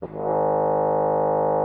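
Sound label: French horn G#3; Xsample VOL-3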